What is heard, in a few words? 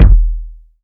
long tail reverb bass drum sample d sharp key